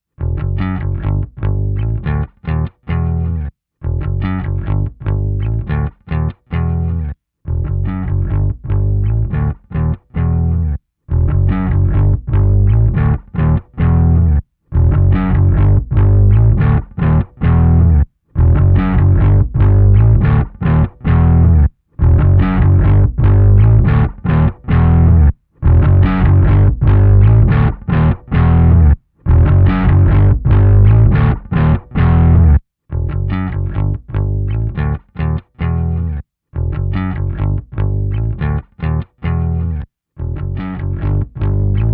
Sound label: overdrive ehx english muffn vs mesa bottle rocket tube swap, bass; I swapped the tubes between the two pedals so the MESA has the 12AY7 pair and the EXH has the 12AX7 pair just for comparison.